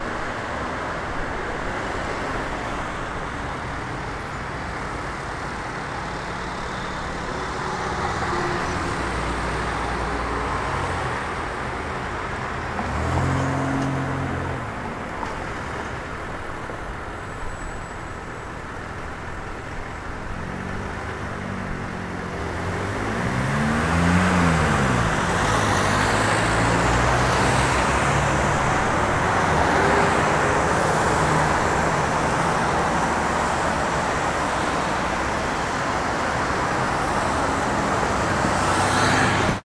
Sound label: cars traffic lights starting, street traffic; best service Blue Box